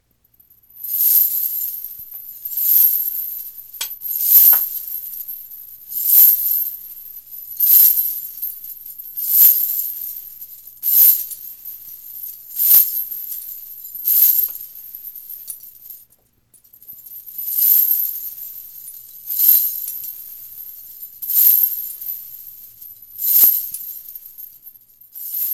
Chains

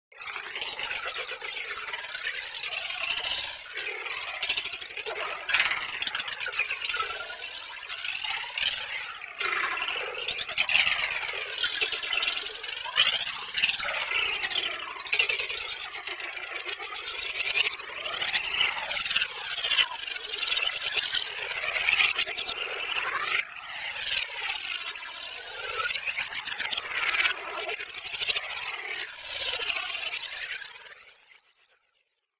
Alien Chittering

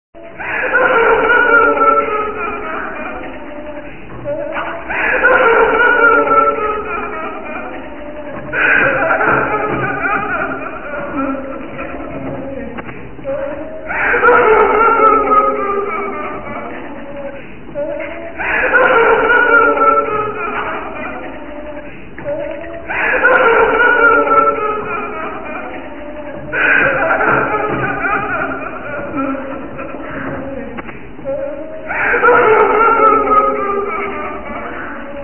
Crying

